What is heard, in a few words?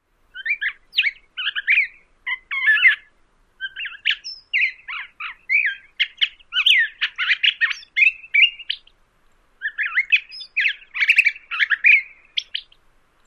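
Curve-billed Thrasher song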